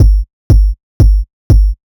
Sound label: Kick, Trance, Drums